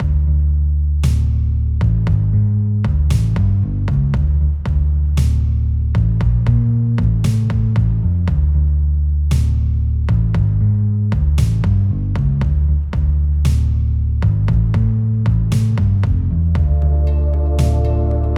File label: stage music; primal light linux macos switch windows xbox one xbox series xs gamerip 2020